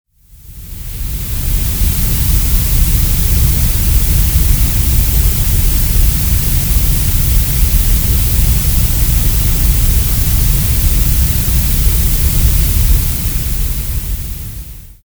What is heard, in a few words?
emerald noise